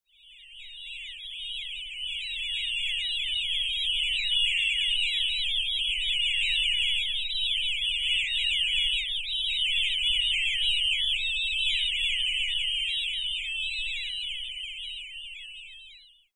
Space Birds, Ambience with sounds of weird birds or aliens, high pitched squeaking; Ambience with sounds of weird birds or aliens, high pitched squeaking and beeping; Lynne Music